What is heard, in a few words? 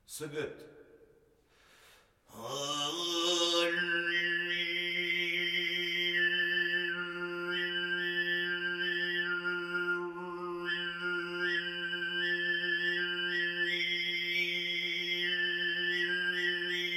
sygyt Style